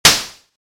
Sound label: slap; 攻撃